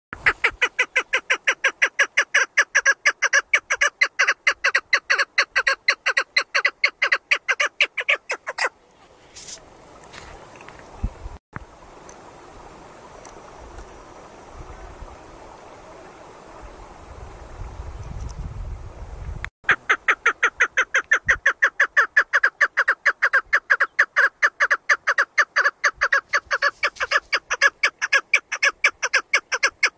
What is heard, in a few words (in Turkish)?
disi keklik sesi